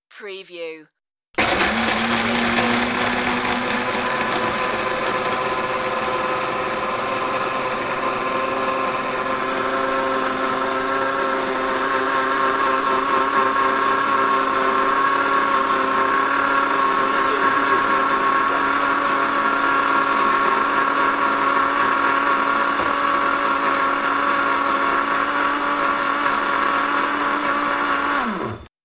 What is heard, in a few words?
Coffee Grinder